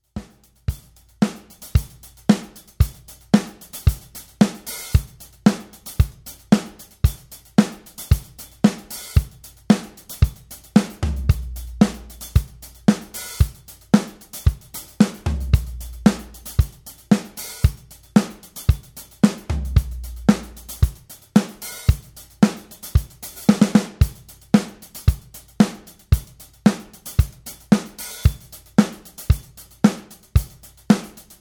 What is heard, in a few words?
80s pop